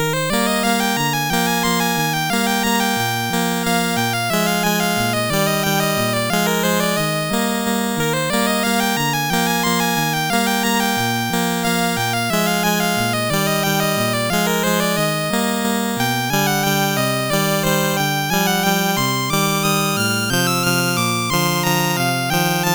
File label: Waltz in G, MOS6581R4